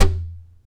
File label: TAP, FLAMENCO Guitars